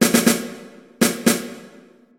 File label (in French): Fa- rythm; Fa Sol / 3 tons 4te aug.